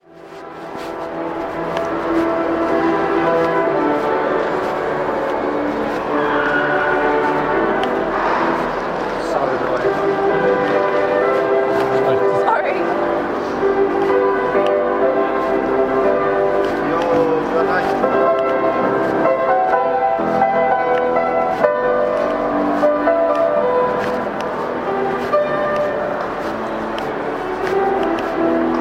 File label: Hull soundscape